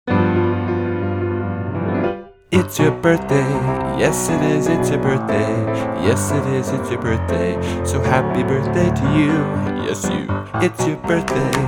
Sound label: Happy Birthday Song